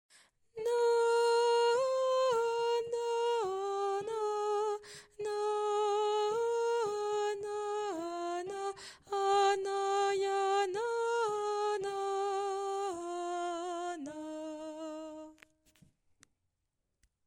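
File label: Soprano; soprane; Mesures 45 à 58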